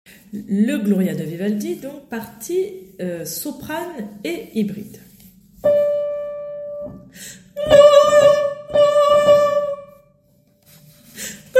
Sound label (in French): soprano et hybride; Gloria jusqua la mesure 49